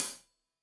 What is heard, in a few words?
Hat Loose, Hi-Hat, Cymbals Stereo